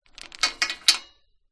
industrial chain hung on metal pole movement short